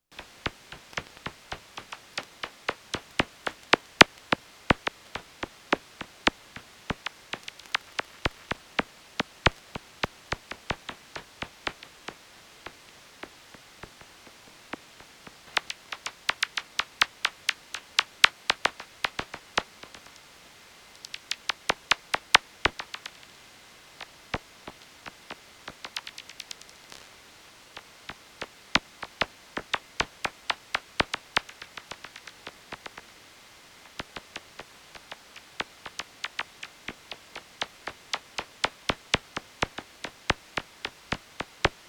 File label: E isabellinus; Andalousie